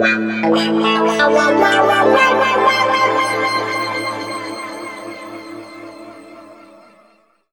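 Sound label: A pitch shift wah, Guitar Samples; East West; Steve Stevens Guitar Samples Collection; PTCH-SHFT+WA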